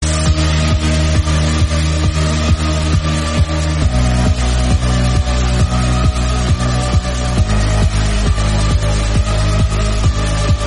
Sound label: instrumental music